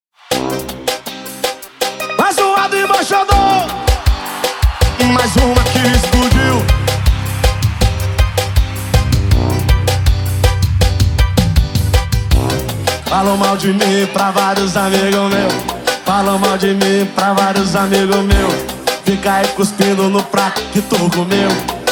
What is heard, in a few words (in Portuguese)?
som automotivo